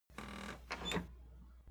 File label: Door Opening